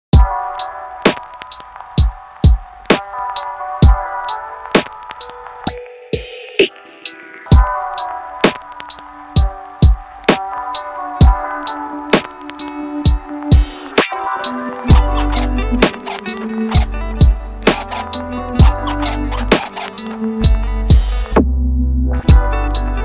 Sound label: Lo-Fi, Coffee Break